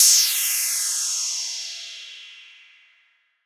crash f key, Royality free crash cymbal sound tuned to the F note. Loudest frequency: 7621Hz